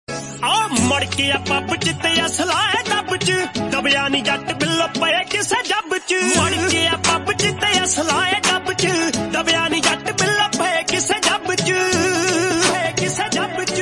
Punjabi Song Ringtone

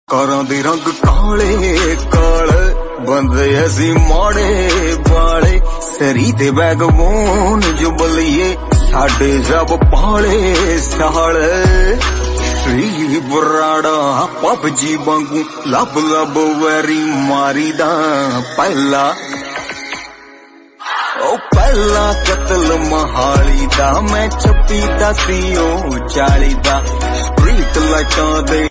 Punjabi Song

